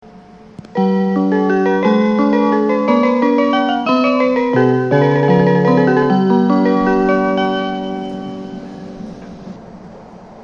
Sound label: Tohoku chime